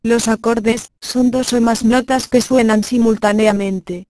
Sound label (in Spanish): acordes